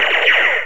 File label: firing deluxe single